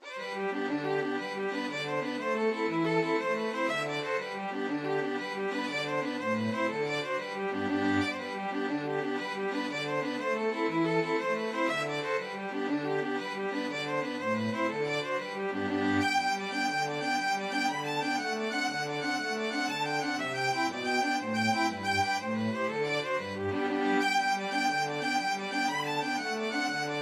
irish jig medley, string quartet

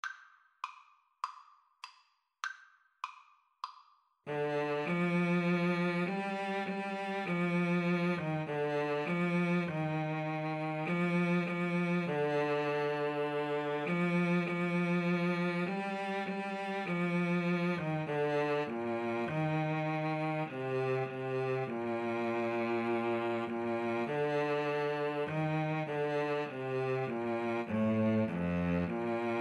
Saxophone Cello Duet

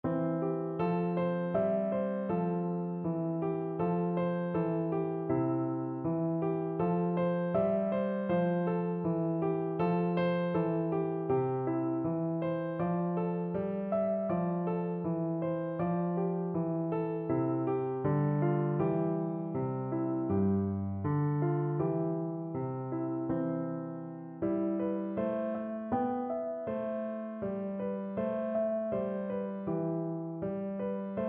melodie piano; franck

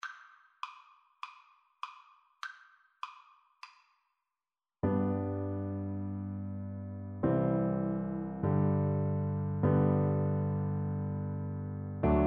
swing low, piano duet

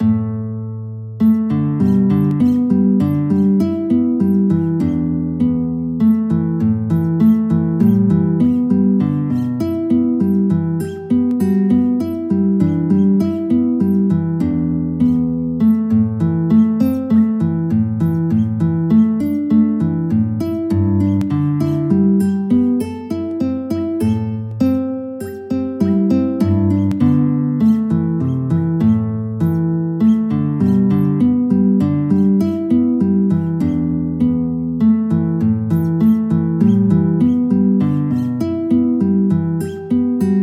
guitar

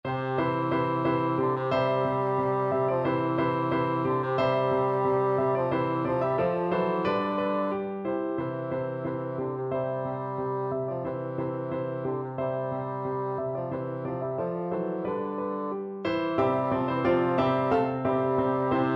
vivaldi spring, bassoon

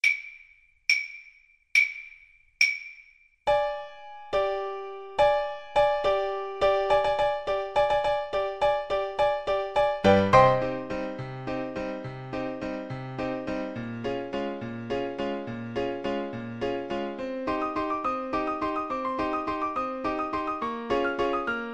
Saxophone; ALTO SAX

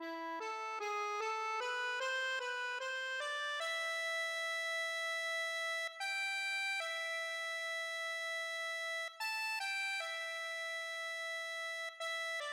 el condor passa, accordion

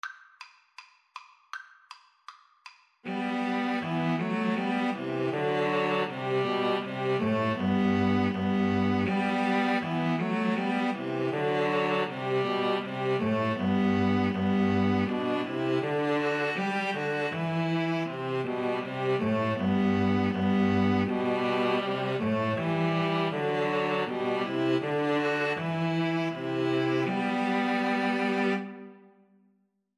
good king, string trio

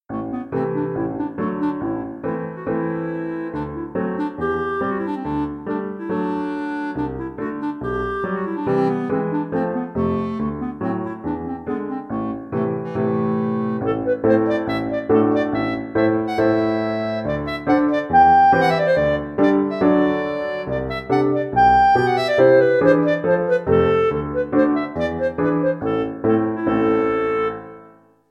bartok romanian folkdance clarinet

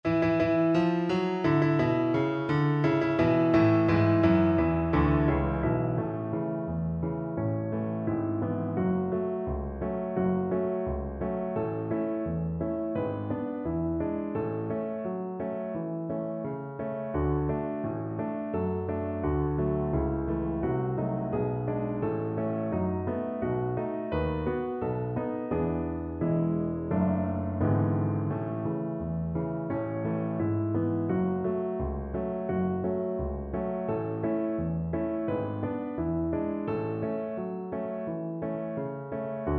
Viola

